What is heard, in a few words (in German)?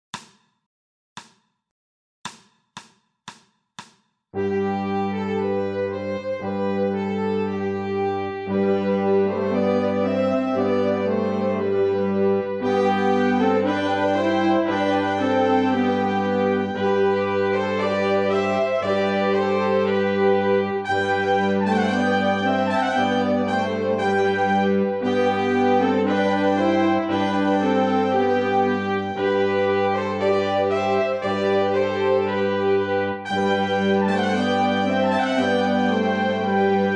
Canon nur Begleitung